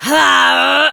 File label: Punch, Barks; Kuva Lich; Persona B; Ability